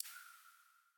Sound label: click Map